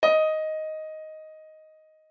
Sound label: d#4, piano